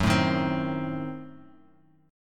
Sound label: F sharp Diminished 7th F sharp Bass Standard; strummed; JGuitar